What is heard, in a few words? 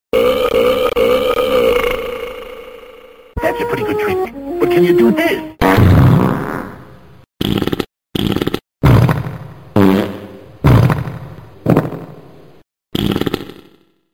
Burp N Fart